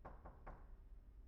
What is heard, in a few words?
knock; IEEE AASP